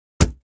Axe Paneling 1 Side, axe hits

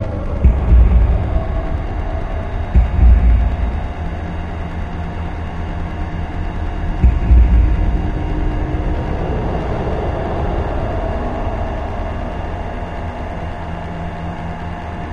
Vitals Dense Thumping, communications

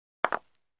Croquet One Ball Hit

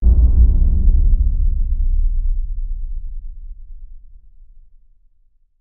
Bass Impact Low Drum; impacts

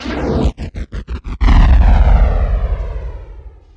Gate Flash Low End, spaceship fx

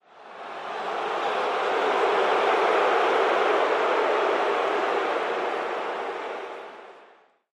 CROWD BOO, large

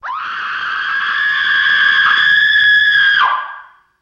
Female Scream Increase Volume; screams

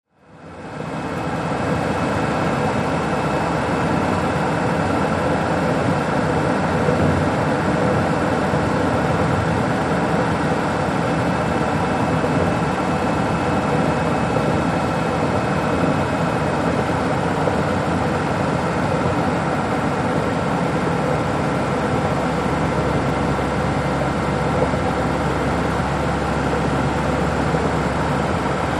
Sm Car Drive 55 65 Mph, compact

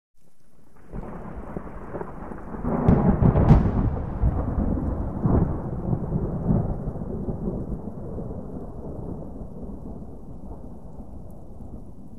Thunder Low Punch

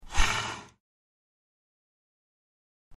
Slow Trackball Spins, mouse

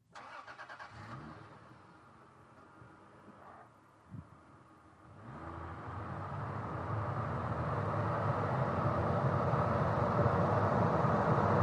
SUV Engine Accele

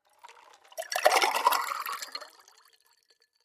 water pour

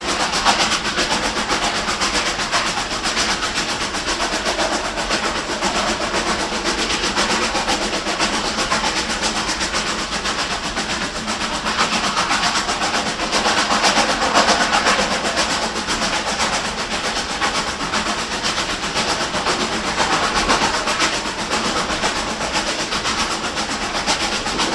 Steam Loco Daylight, steam train

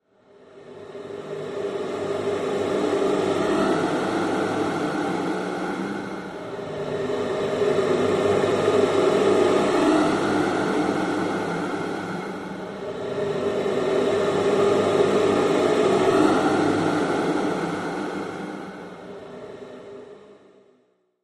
Horror creepy morphed heavy breathing atmosphere

